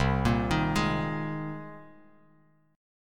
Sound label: C Minor 7th Sharp 5th C Bass Standard; Cm7#5 arpeggiated; JGuitar